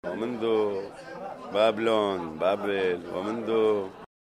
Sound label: loop